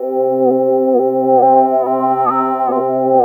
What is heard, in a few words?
WAVE TRIP, WAVEY DRONE; Spectrasonic Distorted Reality 1